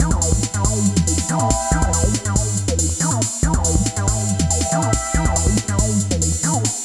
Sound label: techno